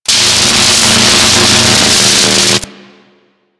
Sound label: Ocean Electric Surge Large; Warframe; Grineer Ocean